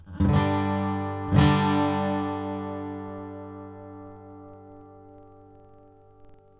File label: 1939 Gibson J-35, guitars